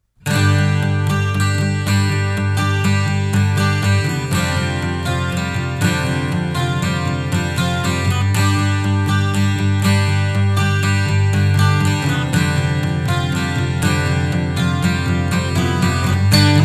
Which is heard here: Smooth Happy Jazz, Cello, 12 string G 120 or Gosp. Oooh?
12 string G 120